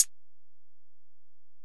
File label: Hit, Closed Hats